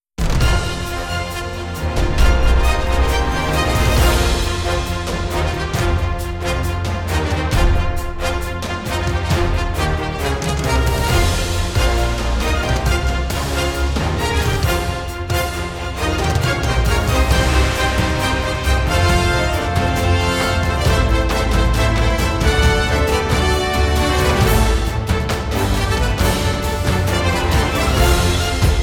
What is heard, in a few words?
Cheerleaders Fanfare; blood bowl 3 original soundtrack 2023